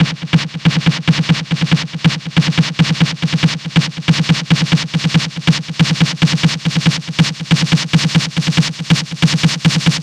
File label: 140BPM SNARE 16 Bars; Vengeance Samples; Snares&FX Pairs